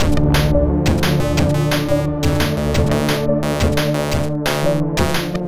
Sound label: Combo Dm, 175 BPM; loops; CHIPSHOP DELUXE